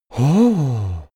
male sigh impressed gentle

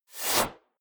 snap

